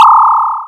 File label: Laser Eyes